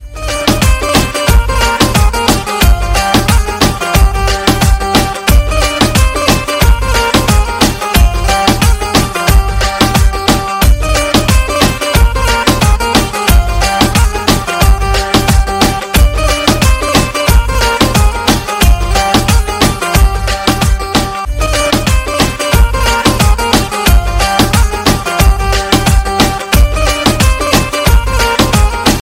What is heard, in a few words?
new guitar rhythm ringtone